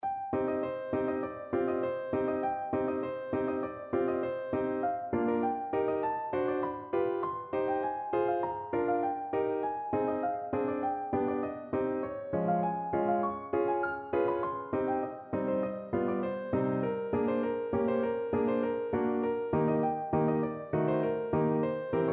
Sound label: vitalite, piano